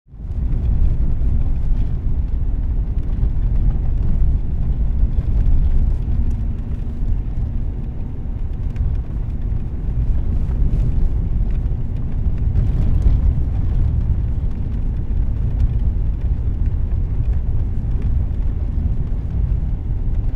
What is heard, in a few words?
car interior; transportation